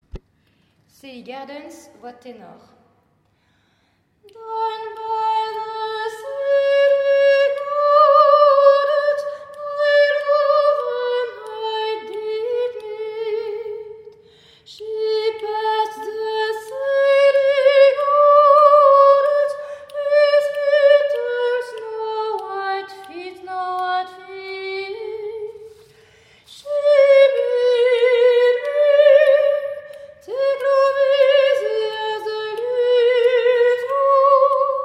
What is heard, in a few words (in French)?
Tenor; Sally Gardens